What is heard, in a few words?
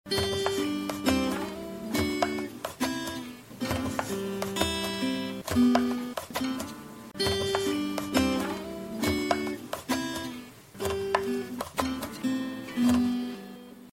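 guitar mix with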